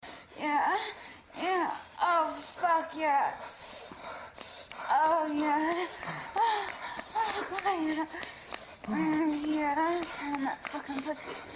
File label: sex, moans; The Witcher Hunt